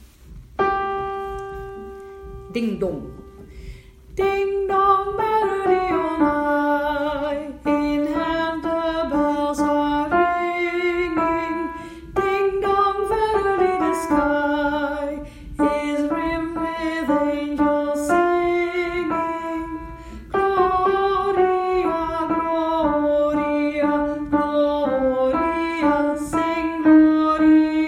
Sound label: Lead